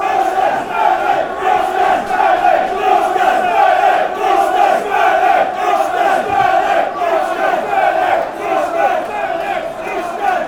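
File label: fanchants, football chants; east end smethwick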